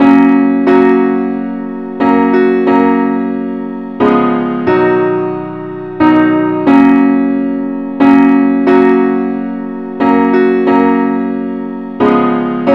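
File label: simple accompaniment key g flat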